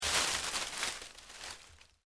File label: LEAVES DEAD MOVE, Rustling; Material components for constructing more complex sounds; Rustling (Sound Effects:Objects)